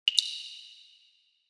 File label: water drop